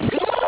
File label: wildcard; gemdropx